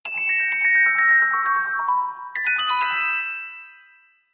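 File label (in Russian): перезвон фрагмент танца; Перезвон — классика; Перезвон колокольчиков создаёт волшебные звуки; Fragment tanca Sahara iz Chelkunchika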